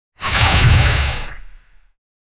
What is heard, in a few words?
aggressive pokmon cry, dragon